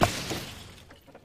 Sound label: object hit wood barrier; lightning speed